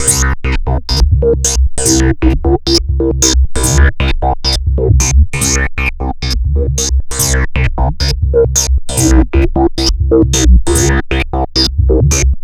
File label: Bass; Sonic Foundry (Sony Creative Software); Machine Language